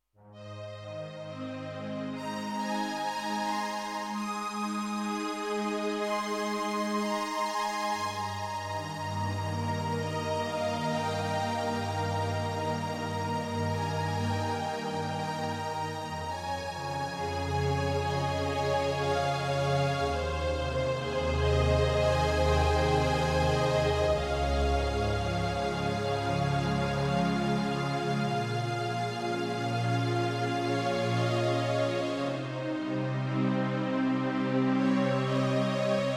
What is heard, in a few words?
Strings